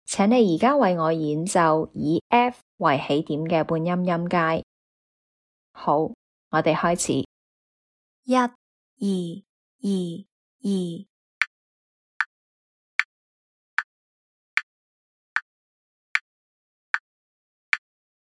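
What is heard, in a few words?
chromatic scale starting F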